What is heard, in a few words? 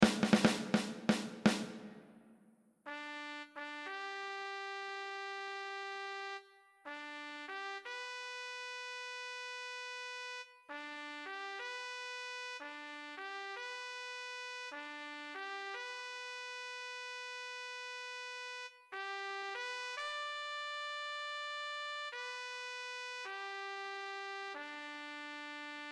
taps, midi